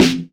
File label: sharp steel snare drum sample c key